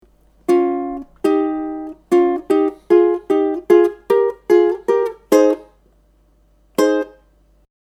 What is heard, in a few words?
Twiddle, ukulele